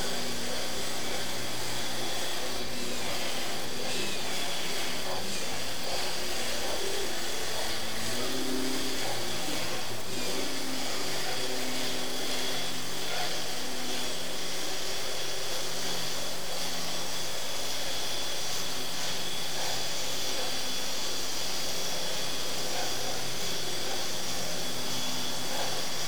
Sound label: Noise, hydro events